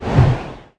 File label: Ice Cast; Project-AI-Chronicle; skill